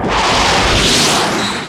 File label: Rocket Launcher Shot; SFX Kit; Weapons